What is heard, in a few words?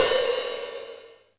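hat open, Alesis DM5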